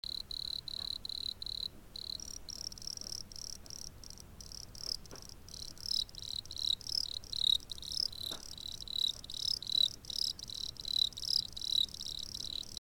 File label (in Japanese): yamato hibari; kisoinaki